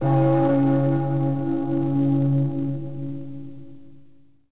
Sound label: bell; Pirates Of The Caribbean